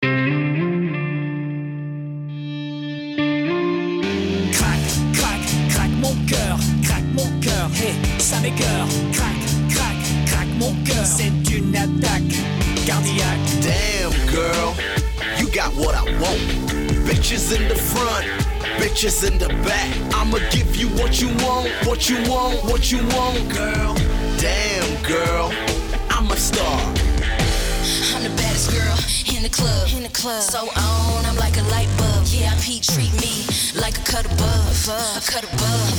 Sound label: Rap mix